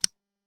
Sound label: button click